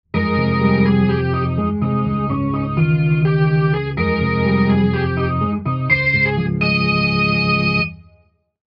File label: rev organ